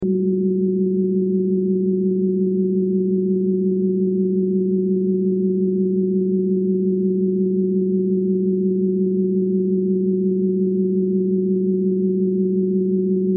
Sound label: Sci Fi Fusion Motor Flutter Drone Soft Loop; Spacecraft